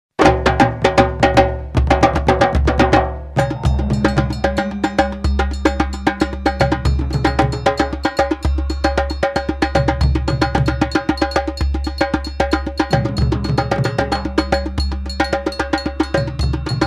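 Dundun, Sangban, Djemben, Guataca, Metalpercussion; Modern Trad. Africa & Cuba; Timeline: Bembe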